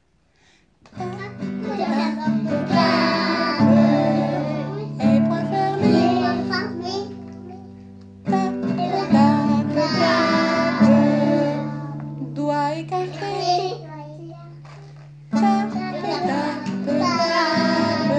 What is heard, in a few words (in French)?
tape tape tape